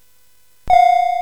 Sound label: Dark Castle, CSG8580R5